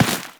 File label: run dirt, footsteps; Warframe; Teshin